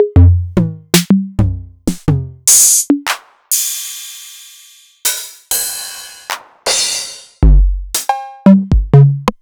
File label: Novation Drumstation